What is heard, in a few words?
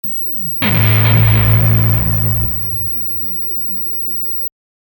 Explosion; Fanny Explosion